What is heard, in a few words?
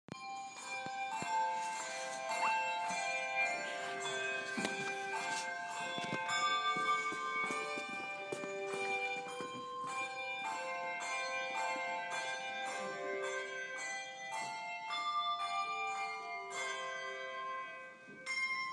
handbell ringers, church; burnham; st peters church